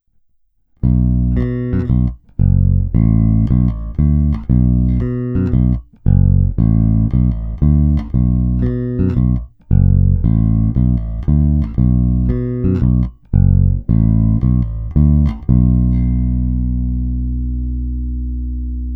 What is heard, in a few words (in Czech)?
Hnědá – krkový snímač; Hráno vždy nad aktivním snímačem; Xotic XJ-1T; Hnědá – oba snímače paralelně